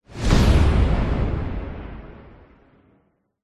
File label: science fiction explosion airy short